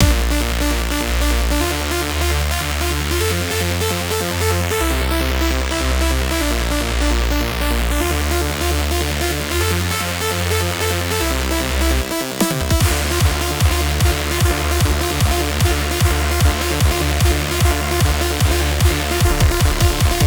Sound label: Heavy Electronic